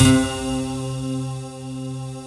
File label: C3, Synth; LEVITAT; Big Fish Audio; Synth City